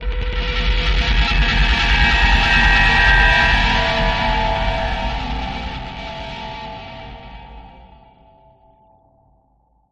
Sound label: Helicopter Fight Damaged